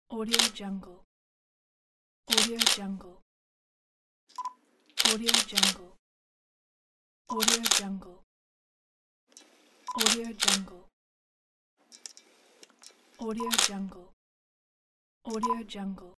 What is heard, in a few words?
camera shutter; voodziavka